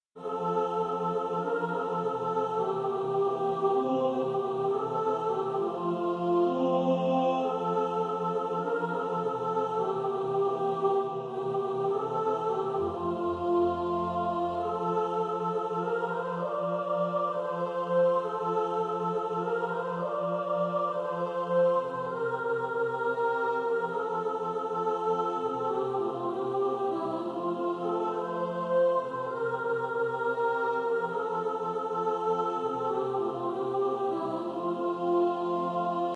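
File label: sop, practice music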